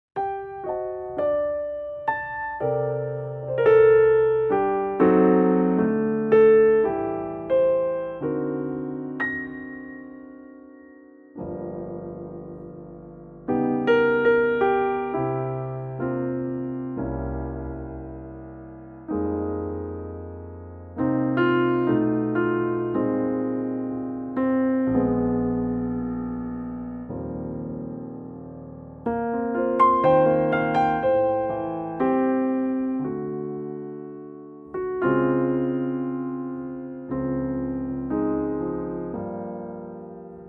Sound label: Bb Piano trak